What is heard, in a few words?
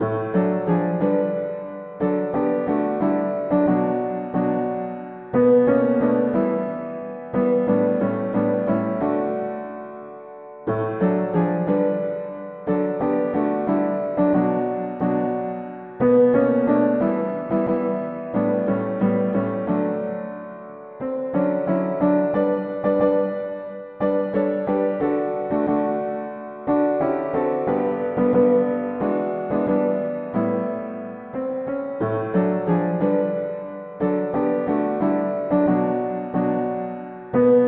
mono piano; hymns